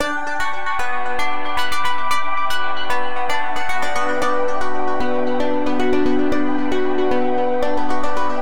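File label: Morphing Plucks, B minor 114 bpm; MIDI Clips; Tonal; Motifs; Ableton; Factory Packs; Mood Reel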